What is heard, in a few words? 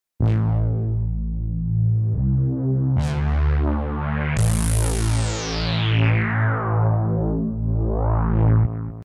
Korg Prophecy VST analog